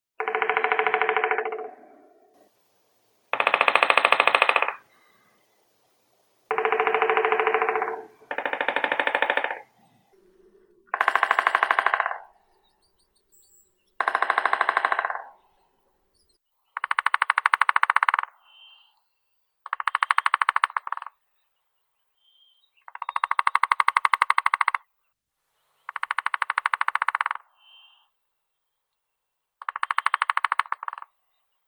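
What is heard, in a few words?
three-toed woodpecker, Picoides tridactylus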